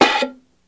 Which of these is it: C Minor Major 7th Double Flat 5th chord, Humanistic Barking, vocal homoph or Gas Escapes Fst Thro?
Gas Escapes Fst Thro